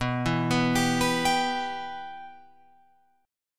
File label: B Suspended 4th Sharp 5th B Guitar Open F; Bsus4#5 arpeggiated; JGuitar